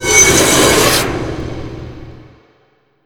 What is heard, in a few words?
SLAM Peeling Metal